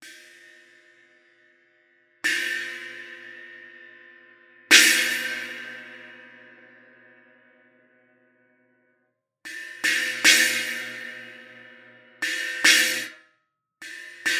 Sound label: Zildjian 12″ Oriental China Trash